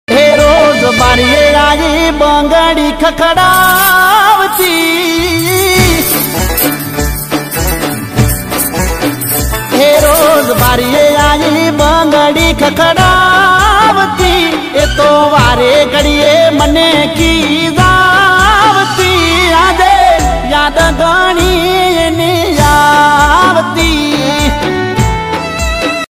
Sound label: gujarati ringtone music ringtone